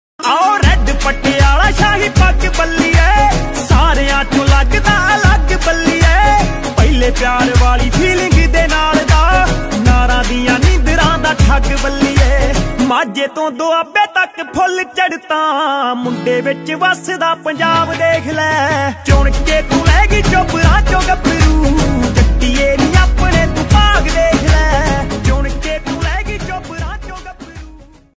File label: DJ Punjabi Ringtone